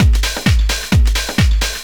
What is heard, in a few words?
drum 130 tribal, drumloops